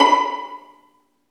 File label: String Vlns Pizz; Roland - String Master Series; Vls Pizz